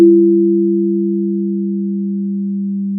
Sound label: pop, Sound of a church bell